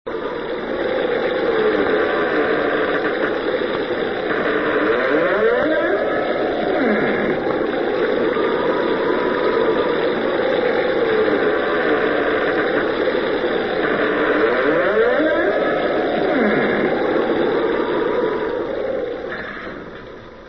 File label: wind creaks